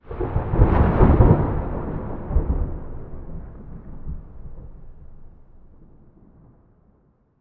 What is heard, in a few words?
thunder; halloweenjack